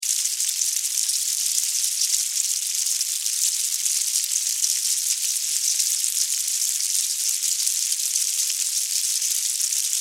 Ant Army, Animal, Ant, Army, Colony, Movement, Insects, Bugs; Surround Sound; 5.1; BlastWave